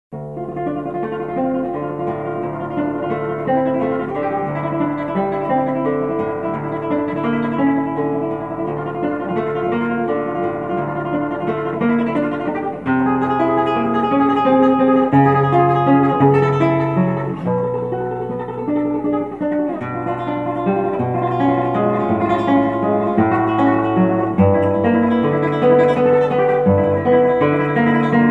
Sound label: Folias Tremolo